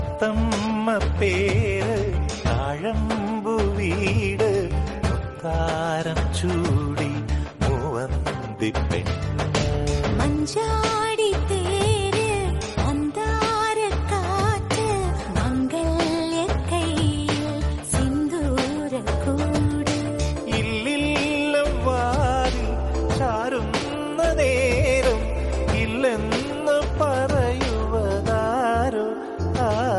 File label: flute